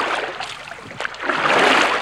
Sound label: ROW, Boat Rowing; Water